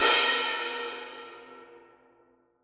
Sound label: melee; sprunki horror mutant; boss